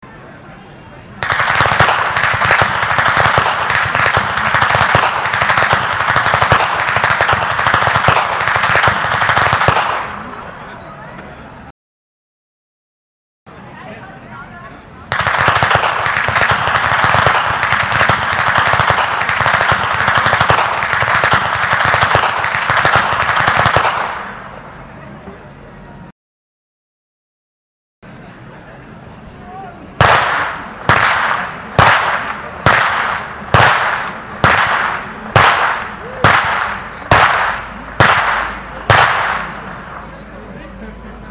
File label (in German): Schnalzen; Bicheln; Ainringer Schnalzen